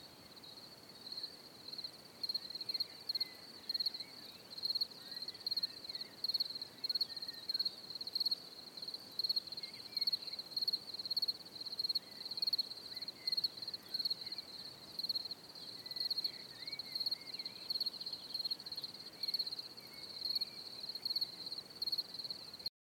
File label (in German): Feldgrille